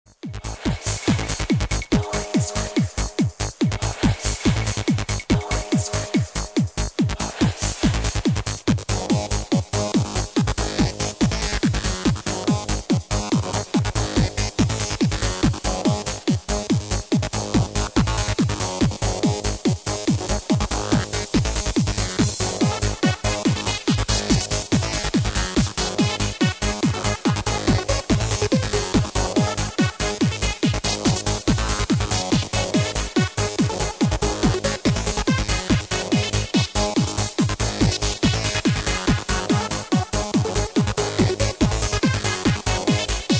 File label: Bass Response